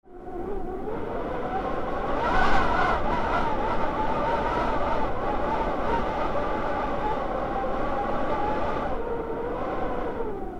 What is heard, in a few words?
strong wind; 季節外れの台風; 季節外れの台風が接近してきた．研究室の天井に備え付けられた換気扇の口から唸り声が聞こえてきた．